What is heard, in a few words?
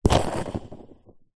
sport skateboard wheel spin air by hand; zapsplat